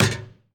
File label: Street Ball Metal Back; SFX Kit; Street Basketball